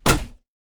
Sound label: yeti vs wood; nunu